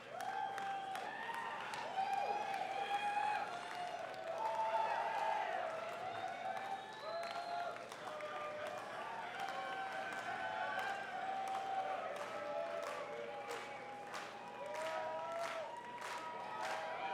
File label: Crowd; Park West Chicago IL USA